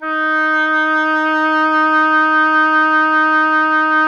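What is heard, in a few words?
oboe, wind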